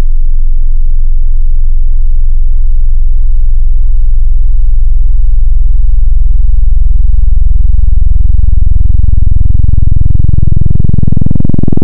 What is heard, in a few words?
Analog PWM Sub, wavetable